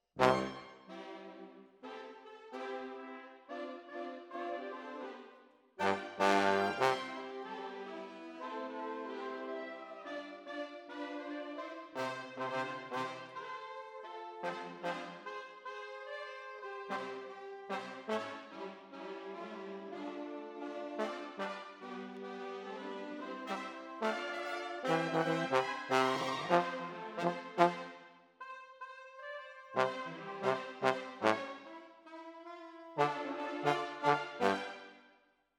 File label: Tromb ASS